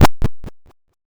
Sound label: switch; Egg Hunter